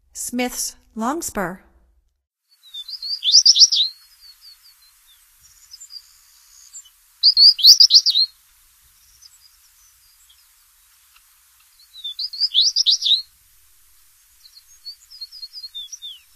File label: Smiths Longspur, Songs of Birds of North America; Stokes